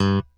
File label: G1 SHORT, D-STIK BASS; D-STIK BASS2